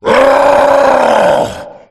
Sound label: tank infect; csgo; zr